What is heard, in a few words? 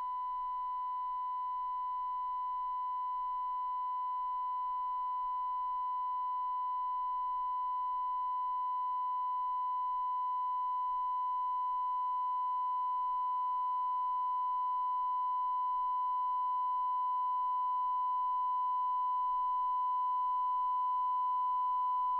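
Calibration 94dB, Car noise; Vectra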